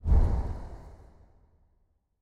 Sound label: pause menu close